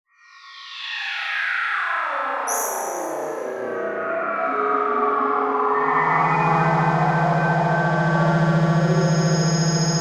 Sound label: Imaging Beeps Digital Glitch; Module Production Element Title Imaging